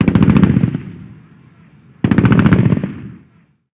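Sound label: gun assault medium; Call of Duty Modern Warfare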